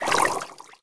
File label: Fluid Vitesse FX Water